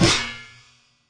Fracture Clap; ModeAudio Found Percussion Hits

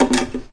shaking this thing; Weird and Interesting Hits; single hits

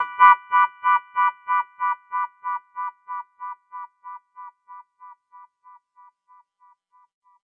wah, Guitars

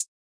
Hat, Hip Hop; drums; STYLE KITS (Hip Hop, Etc)

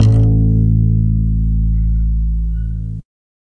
Upright Bass Notes

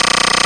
c64 sid noize, Commodore 64 8bit SID Drums; LO FI and 8 BIT KITS

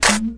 snare

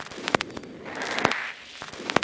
double hit clicks; LOOPING AMBIENCE

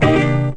chord down guitar

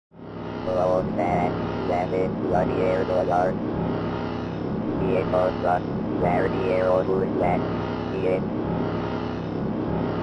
martian landscape robots and ambience; Electro and Synthetic

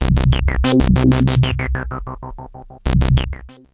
SH101; SYNTH AND ELECTRONIC

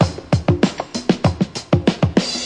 96 punchy conga funk; 091 to 100 bpm

